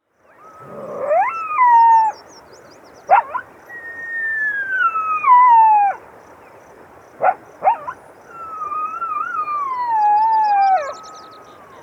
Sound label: coyotes; ringtone